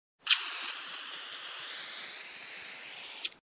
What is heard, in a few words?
Soda Spray